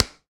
Pickelball Paddle Hit Ground Hard, Ball Collision

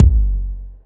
Ball Collision Geo Extra Hard

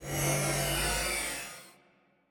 Skybox Scan Beam Entry

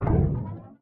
boost Highway Paddle

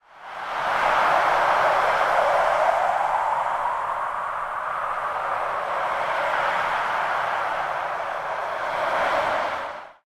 Countdown Noise; Sports; Tackleball

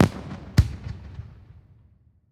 Fireworks

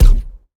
Ball Player Hand Hit Extra Hard

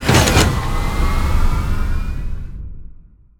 Spinning Arm Reverse; Scraprun; Deathrun

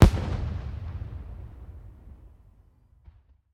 Celebration Fireworks

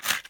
Player Paddle Release Layer Ice Heavy

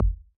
Ball Collision Geo Tackleball Extra Light

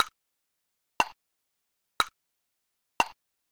Tick Tocks 120bpm; Quests

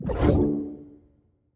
Training Ring Player Through; Sports

